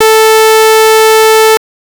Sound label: Saw wave